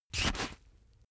boxing gloves slip in fast; sport freizeit; boxen

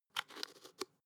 spice jar adjust

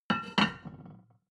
plate on plate place soft; sport-freizeit; restaurant-cafe

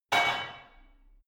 barbell only replace reverberant hard

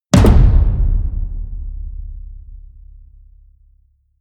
drum hit loose medium; percussion

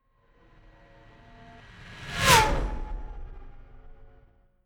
fly by hover car hum high

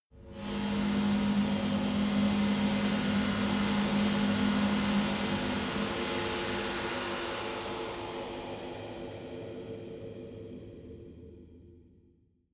atmo drone stinger, horror

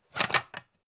pan take

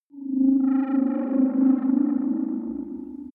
far fighting racer, raumschiff

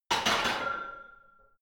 barbell heavy plates replace rack; sport freizeit; fitnessstudio

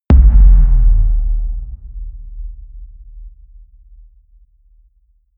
bass drop

